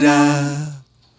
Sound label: Dah D3, Lounge Hitz